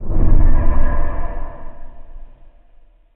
noise, ambient

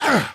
pain

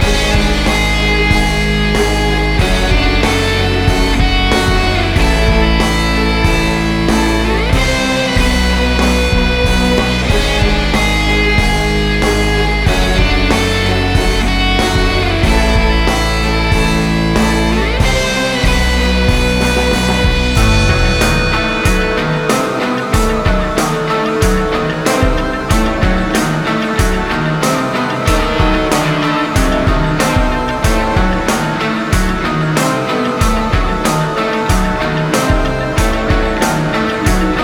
theme, music combat

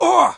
wound, stalker; monsters

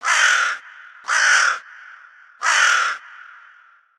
crow, outdoor ambient

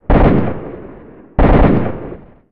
rnd shooting, ambient outdoor

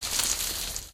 bushes, human step

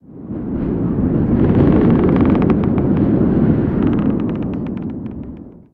wind tree, ambient outdoor

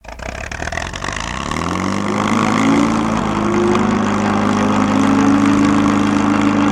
generator start; ambient; pripyat

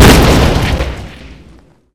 f1 explode, weapons

